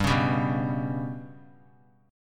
G Major Flat 5th chord; GMb5 strummed; JGuitar

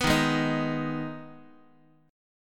D sharp Suspended 4th Sharp 5th, Guitar; strummed; JGuitar

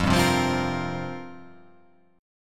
F Suspended 2nd Suspended 4th F down

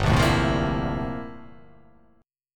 G Minor 13th G down; Gm13 strummed; JGuitar

